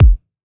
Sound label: KICK